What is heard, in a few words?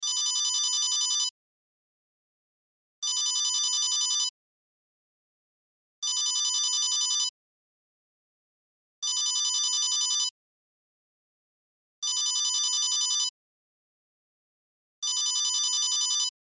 Cell Ringtone; Loop; Technology; Cell Phones; Electronics